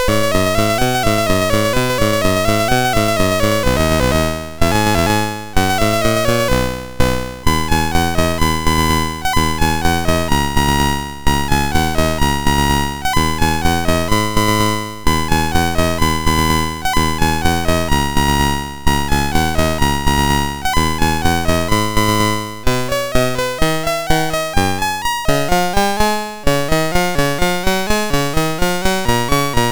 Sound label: sid, MOS6581R3; Booga-Boo the Flea